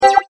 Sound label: absorb Photon; molecules and light; greenhouse effect